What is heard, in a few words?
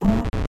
notification beep